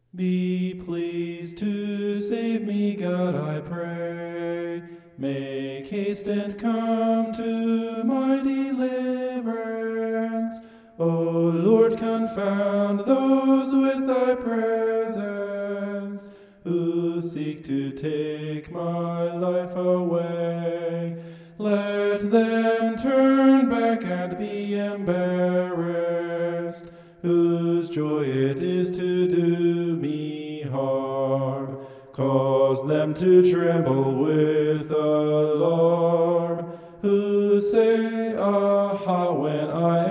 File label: vocal homoph; psalm 70